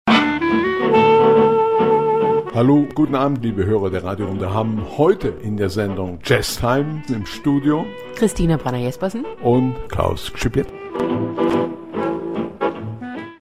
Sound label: Intro Jazztime, Jingles